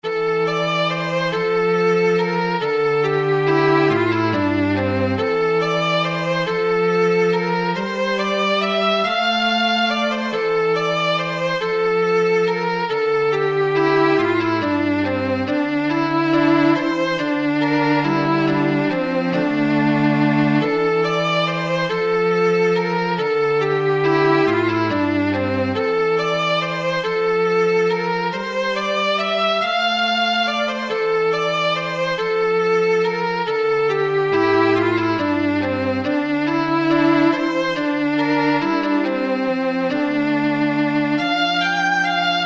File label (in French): Valse écossaise; du diamant